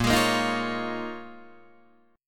Bb Minor 11th Bb down Guitar Open D

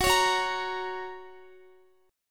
Gb Major Gb Guitar; Listen to Gb strummed

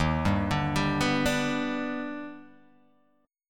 D sharp Augmented Major 7th, Guitar, Open G

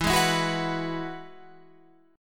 E Major Add 9th, Guitar Standard; strummed; JGuitar

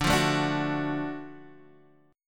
Gb Minor Major 7th Sharp 5th, chord

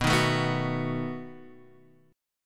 B 7th B down Guitar Standard; B7 strummed; JGuitar

